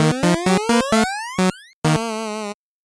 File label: 8bit jingle fast